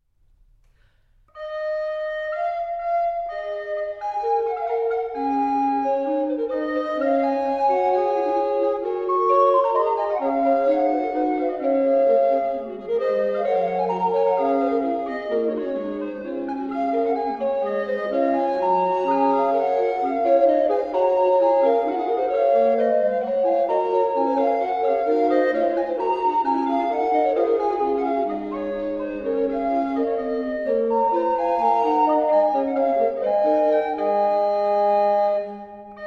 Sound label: Recorder Quartet; Ein gmeiner Brauch; Ludwig Senfl